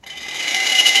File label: shuriken spinning; martial arts; epic